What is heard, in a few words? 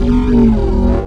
gravity link beam; Earth and Beyond; EA Games